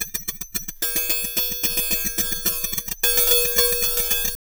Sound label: Tabla, 110 BPM; Zero-G; Pure Tabla